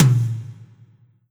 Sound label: Roland SC-88 Mid Tom